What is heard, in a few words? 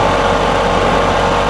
cement mixer